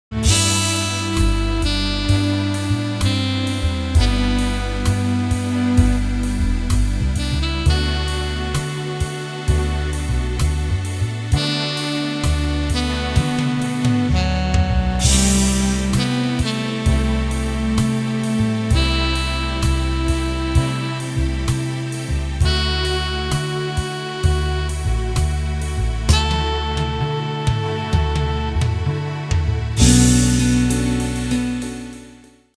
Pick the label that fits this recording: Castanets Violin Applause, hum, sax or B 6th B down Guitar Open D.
sax